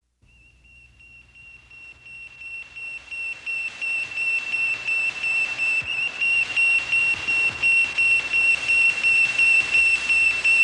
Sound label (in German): sputnik 1 (telemetrie-signal); Science Fiction